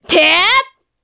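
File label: Vox Attack, Japanese; voices; heroes; Priscilla; kingsraid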